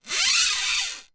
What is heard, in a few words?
Foley Metal Squeaks Fast